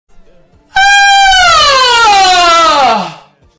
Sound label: aaaaaaaaaaaaa